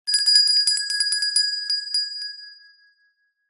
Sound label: bell small hand ring short